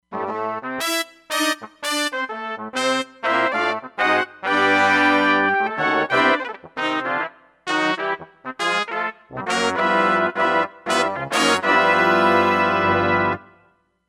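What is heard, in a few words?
Trumpets & Trombones; Roland Fantom XR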